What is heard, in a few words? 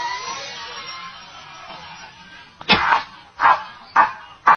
cat laughing meme; میم خنده گربه